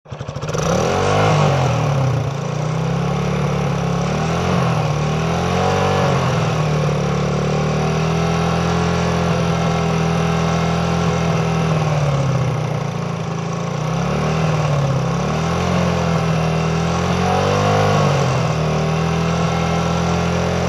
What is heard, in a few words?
Constant 125 Cc Motor, Motorcycle; Constant; 125 Cc Motorbik, dirt bike